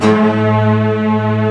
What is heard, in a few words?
D3, YAMAHA, Analog; USB Soundscan vol.49 - Analog Fantasies; AKAI